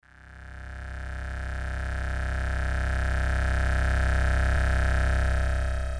cyber Scape, cyberspace; Dystopia

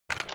draw, weapons; Dystopia; mk808

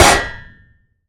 metal impact, Bullet Impact; Dystopia; physics

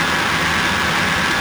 Generator Loop; Dystopia; injection

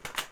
draw; Dystopia

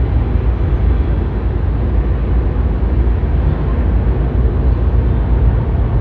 ambience; Dystopia; cybernetic

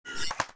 heavy draw, weapons; Dystopia

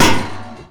metal barrel hard; Dystopia; physics

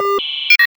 touch cancel

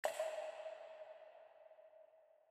drips, injection; Dystopia

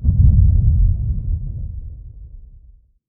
underwater explode; Dystopia

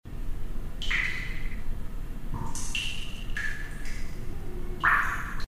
water drops; Dystopia; fortress

